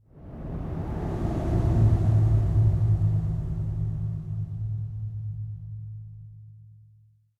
cave, ambient; HunterZ G4; minecraft

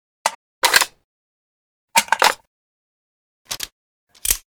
MG42 Reload; Modern Weapons Pack

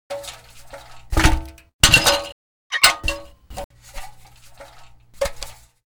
bazooka Reload; Modern Weapons Pack

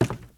ladder, step

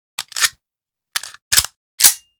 TT33 Reload; Modern Weapons Pack

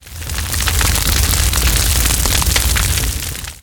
flame thrower fire hit; matmos hl; atmosphere

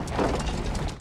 minecart; matmos extras

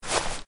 grass, step

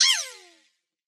attack, mob slime

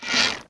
bow stretch; matmos interactions

